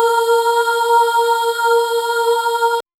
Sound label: synth like vocal calm fem